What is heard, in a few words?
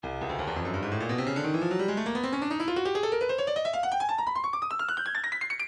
breakbeat midi files 140 170 bpm 170; Stylus